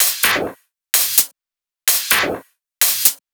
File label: drum loop 128 Top; Anthemic